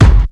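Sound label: bass drum gate FX; 300 Drum Machines; Yamaha Motif ES-Series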